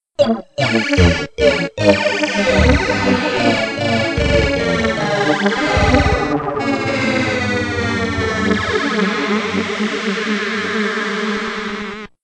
game Over; Sonic

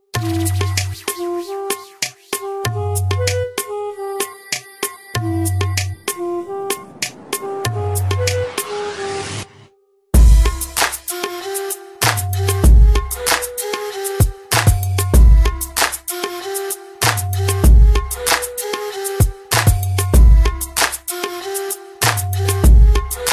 Arabic Beat

